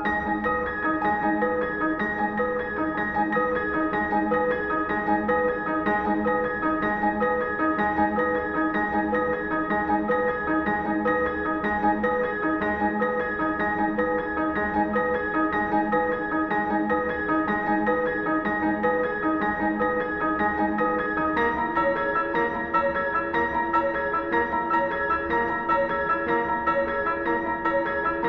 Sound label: Canto Ostinato, Minimal Piano